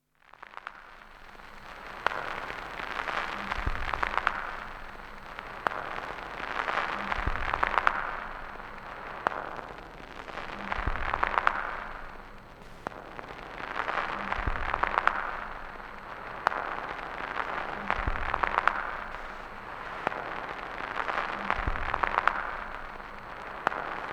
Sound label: violins